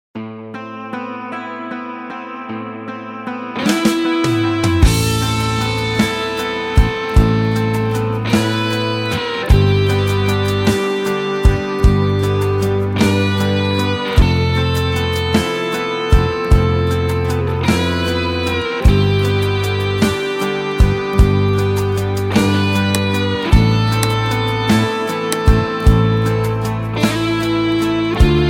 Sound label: Guitar